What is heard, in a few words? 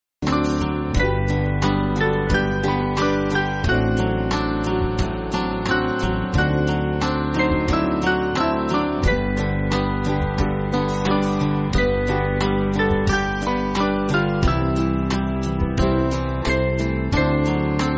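Band, Backing Files for Churches; Not Now But In The Coming Years; McGranahan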